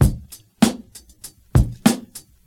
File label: 98 bpm breakbeat c sharp key; sample